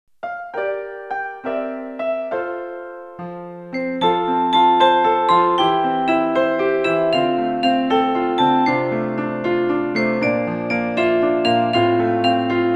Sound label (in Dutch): zonder zang